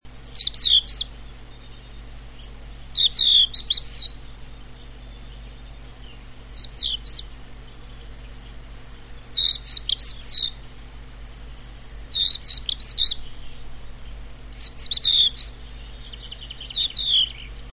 dzierlatka - śpiew na ziemi; wroblowe

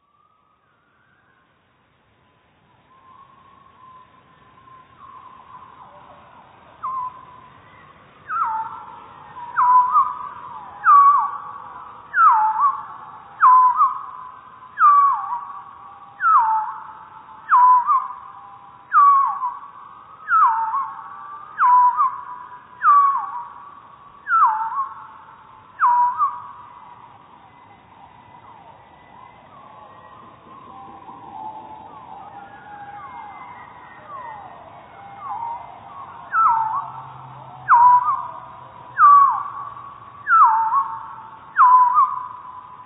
pierwiosnek, 4-krotnym zwolnieniu i obniżeniu o dwie oktawy; pierwiosnka śpiewającego blisko mikrofonu

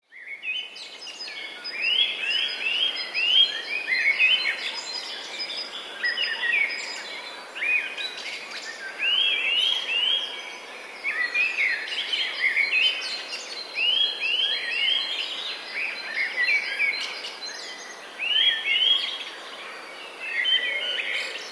kowalik; Laskach

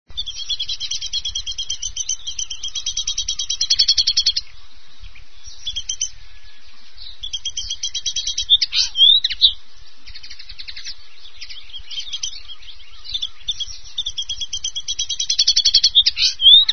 makolągwa - trochę inny śpiew (gorsze nagranie)

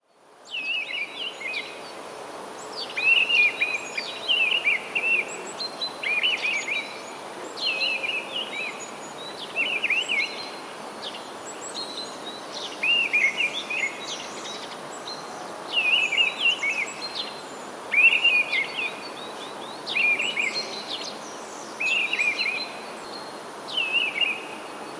paszkot

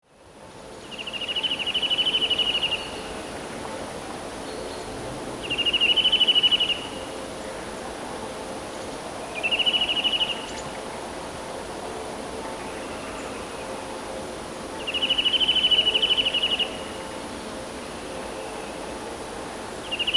kowalik szybkie tryle; gdzie niektóre gwizdy brzmią nieznacznie wyżej lub niżej, przez co tryl brzmi troszkę melancholijnie

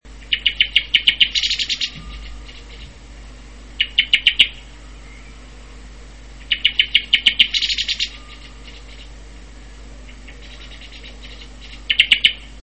drozd śpiewak - nawoływanie; wroblowe; turdidae; turdus

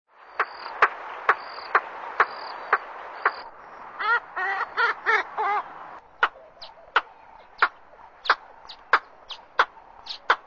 sułtanka afrykańska - Porphyrula alleni; ptak dotychczas w Polsce nieobserwowany

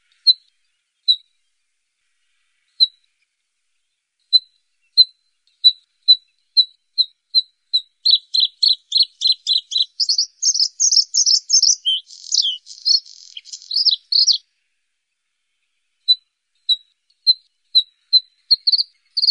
podróżniczek; wroblowe; turdidae; Podróżniczki są pod ochroną.

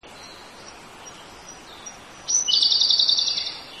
słowik modry - jedna fraza; luscinia; wroblowe; turdidae

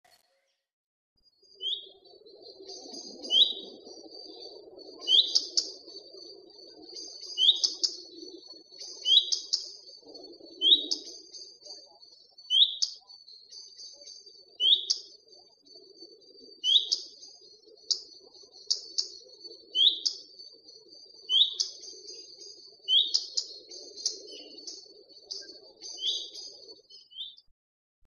pleszka, nawoływania; wroblowe; turdidae

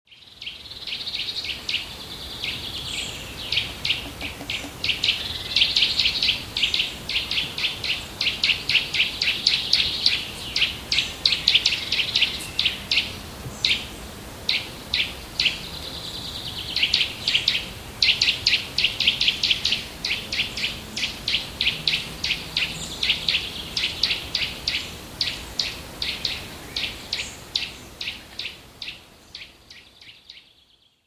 kowalik szczebiot, szczebiot kowalika, którym ptaki odzywają się przez cały rok

